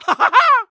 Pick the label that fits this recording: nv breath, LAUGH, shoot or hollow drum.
LAUGH